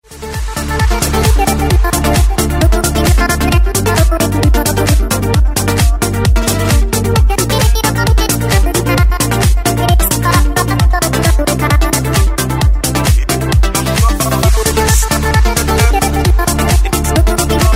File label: Trap Samsung Galaxy